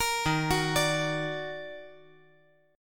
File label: Eb Minor Major 7th Eb down, chord; EbmM7; arpeggiated; JGuitar